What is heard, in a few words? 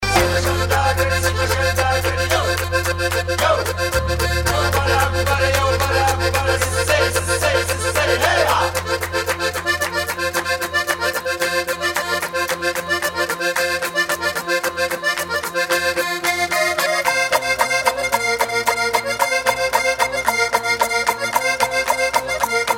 Circassians Music